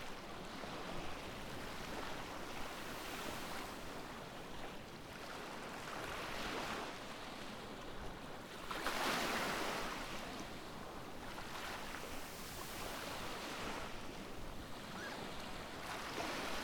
outdoor sea

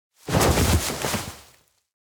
bump; spica mugi; anemoi

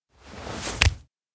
handshake; koyomi; kurumi; anemoi パッケージ版 1.1

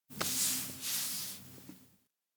touch arm; anemoi

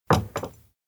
desk place coffee two; anemoi

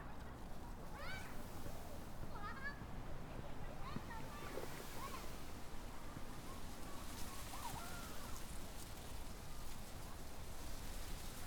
outdoor school

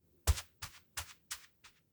steps indoor observatory kigurumi walk; awayuki; anemoi パッケージ版 1.1

